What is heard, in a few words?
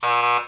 BUZZ